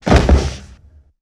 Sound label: Body Fall On Wood, Hand To Hand Combat; Sony Pictures Sound Effects Series